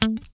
GUI A3, E-Gui Muted; Sound & Vision; Gigapack I CD 2

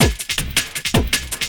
Loop, Drum & Bass, 160 BPM

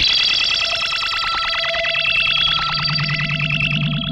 ZAP GUN, Funk Guitar; 90 s Sample CDs; Zero G

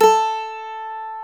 MANDOLIN

